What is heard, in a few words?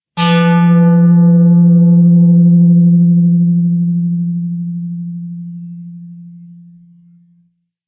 Blow On A Copper Basin